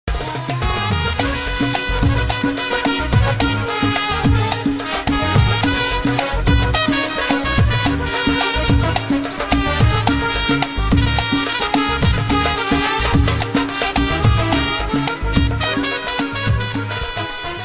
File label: Trumpet